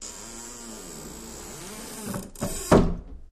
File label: Door Bi Fold Close